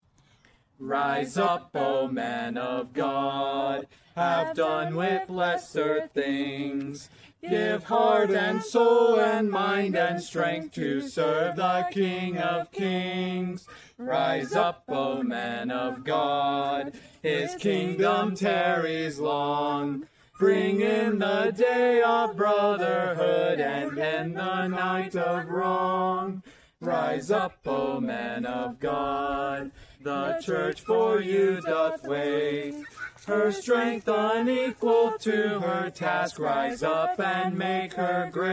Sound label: sung by good soldiers of Jesus Christ; Rise Up, O Men of God